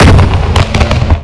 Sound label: backfire ex, kart